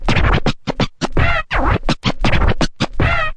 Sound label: turntable HIT, Scratching and Turntablism; DRUM LOOPS and BREAKS